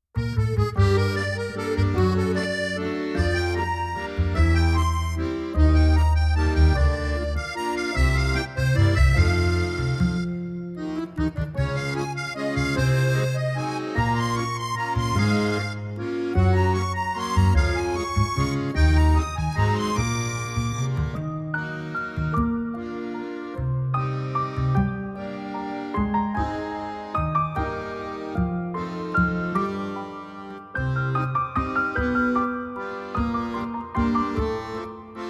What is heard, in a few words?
French Accordion Waltz